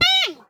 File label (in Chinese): 熊猫受伤声